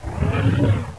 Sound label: Hunter